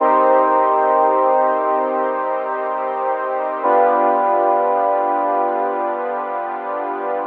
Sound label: Serum Pads